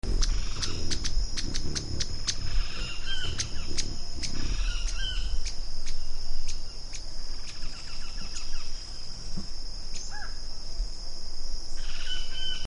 many spotted hummingbird

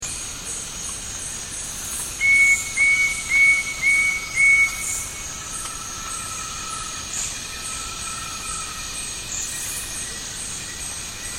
ringed woodpecker

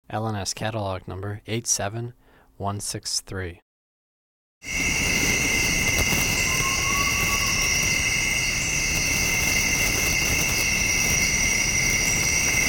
akun eagle owl

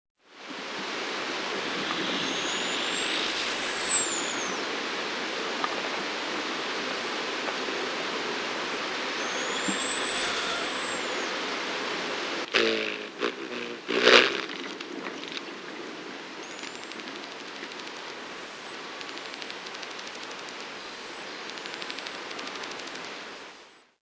costas hummingbird

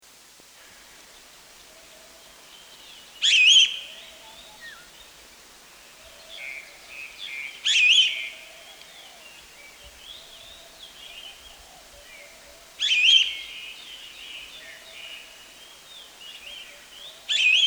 large cuckooshrike

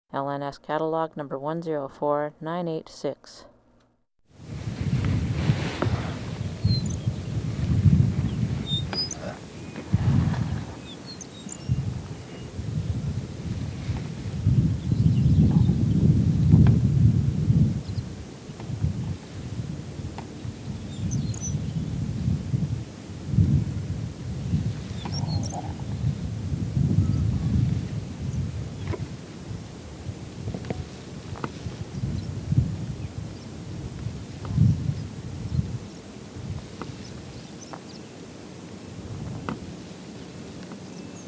temmincks horned lark

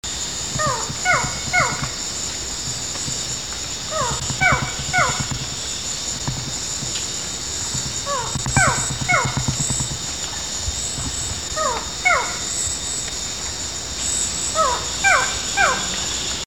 lined forest falcon

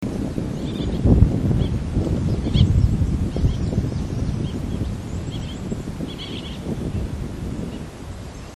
rufous fronted parakeet

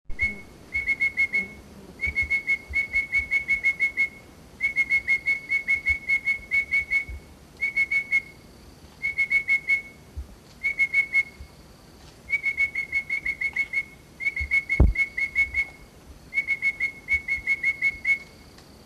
grey headed robin

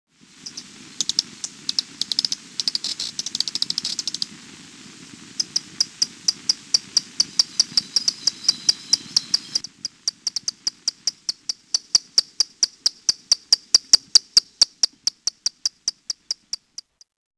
white eared hummingbird

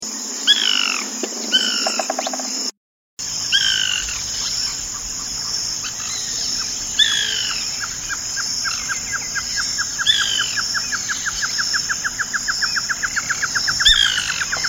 white woodpecker

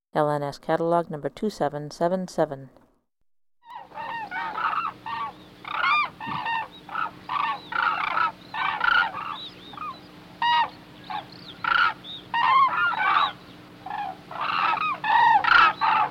hooded crane

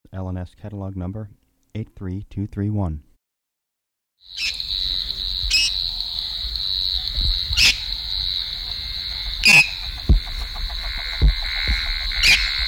brown necked parrot

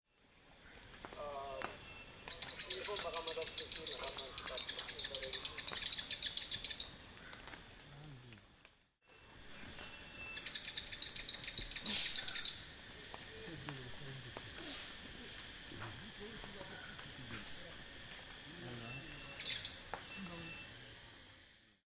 edwardss fig parrot

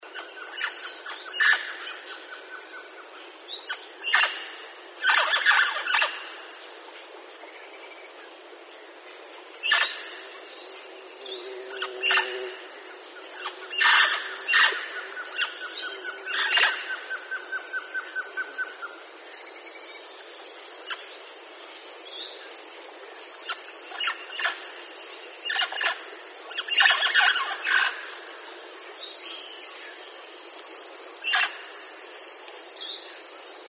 kawalls parrot

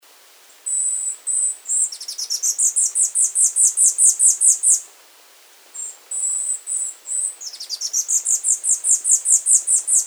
tanager finch

